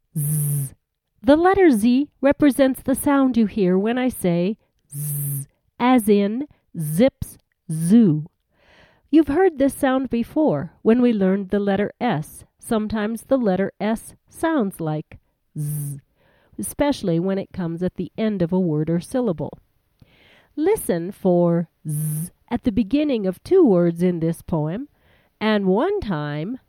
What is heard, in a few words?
Bass Drum